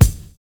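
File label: SNARE ON 2; Univers Sons - Kitch 70s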